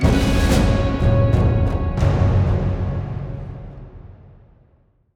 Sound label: Notification Cue, Music; Boon; Warframe; Duviri; Stingers